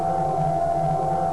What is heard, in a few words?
Motor, Train; Z5300; OpenBVE